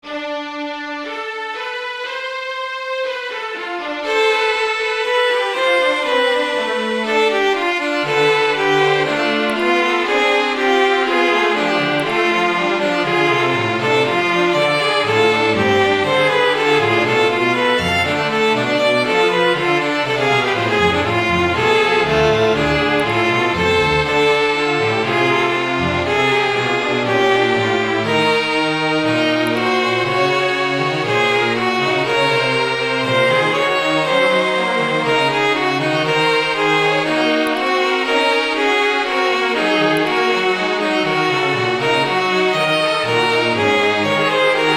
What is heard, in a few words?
string trio fugue